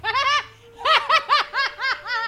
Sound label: snarfy laugh short